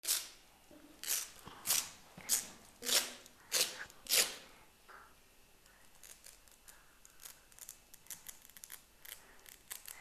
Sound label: scratch; maternelle theme autour de l'ecole